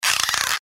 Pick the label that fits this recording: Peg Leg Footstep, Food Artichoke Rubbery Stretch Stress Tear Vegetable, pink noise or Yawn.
Food Artichoke Rubbery Stretch Stress Tear Vegetable